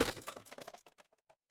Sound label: impact crash